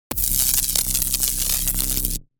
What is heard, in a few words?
Unstable electrical current electricity buzzing; sound design effects